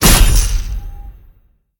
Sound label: hit; Smite; Tiamat